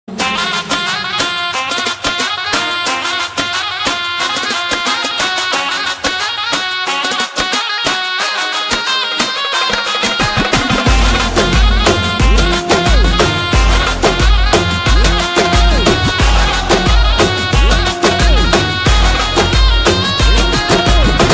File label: title song music only